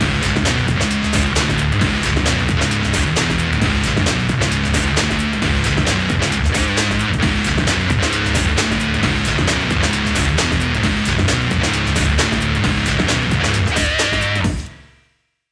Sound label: movie guitar; Chrome